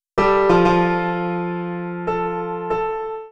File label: short melody